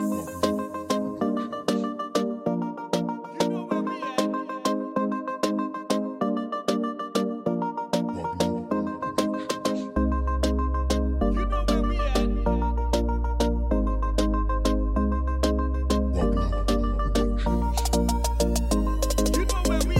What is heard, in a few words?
Reggae Dancehall